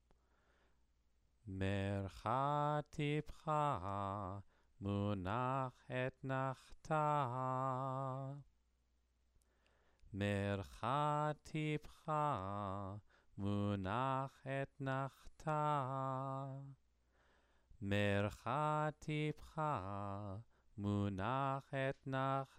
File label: Megilat Esther exercise